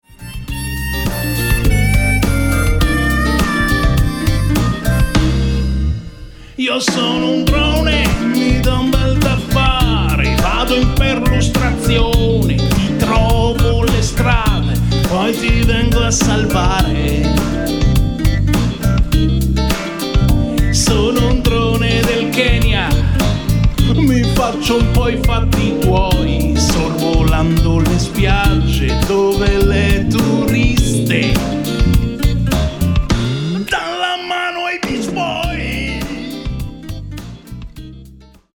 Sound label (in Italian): il drone africano